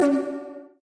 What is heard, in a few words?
Sms